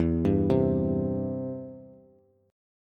E-Minor Sharp 5th, Bass; Em#5 arpeggiated; JGuitar

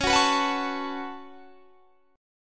C sharp 7th Suspended 4th Sharp 5th; C#7sus4#5; strummed

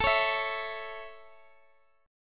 A Major, Guitar, DADGAD

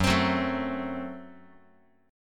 Gb Diminished Guitar; strummed; JGuitar

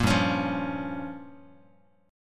G Minor 7th Flat 5th, Guitar; strummed; 1,3,4,3,x,x; 4A,4E,5A,5D,5G#,6C#; JGuitar

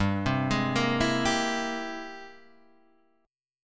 Ab Augmented Major 9th G, guitar

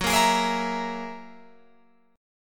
Gb 9th Gb down Guitar Open F; Gb9 strummed

